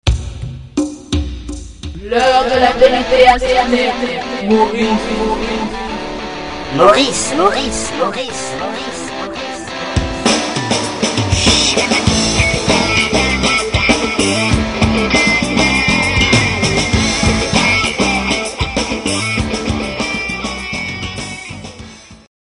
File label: jingle